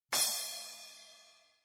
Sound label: splash